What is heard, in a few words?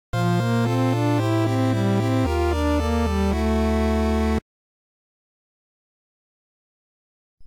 Item Obtainment; Metroid FDS